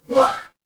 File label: feedback achievement